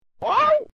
WOAH, reverse

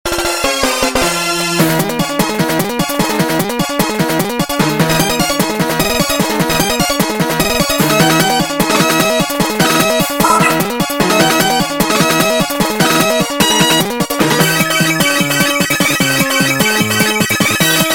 victory

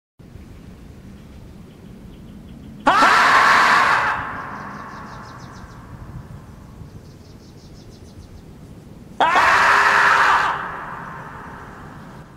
marmot; cave

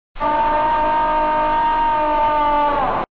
scream

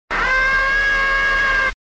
scream, reverse

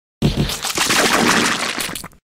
splat; cave

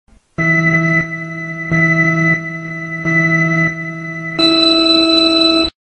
race start; reversevape

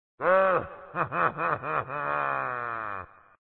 wah

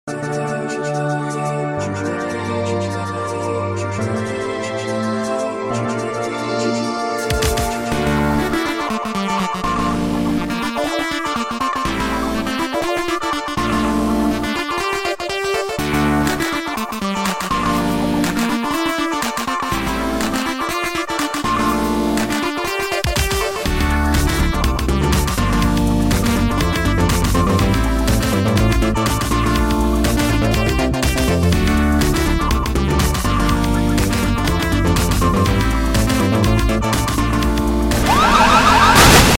funky piano; cave

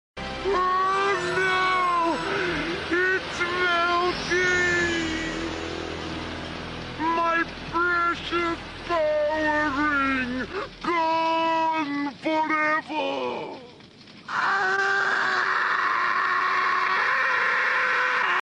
power ring

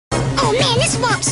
whomp

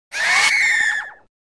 scream

